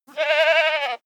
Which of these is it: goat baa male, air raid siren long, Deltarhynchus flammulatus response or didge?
goat baa male